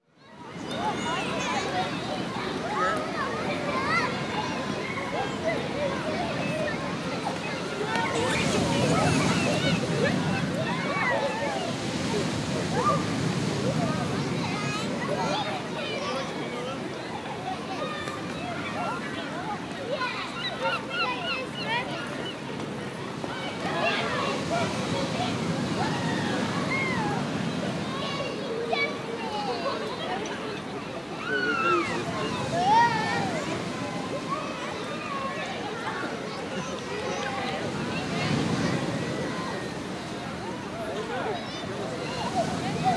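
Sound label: Beach, exterior atmosphere ambience with people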